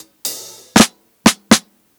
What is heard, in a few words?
120 FILL, DRUMS LOOPS; BASEMENT DRUMS VOL 2